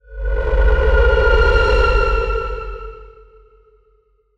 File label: Stinger Descending; starcraft 2 wings of liberty